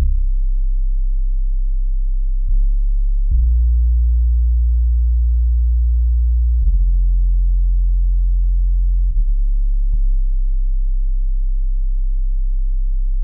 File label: deep base, 145BPM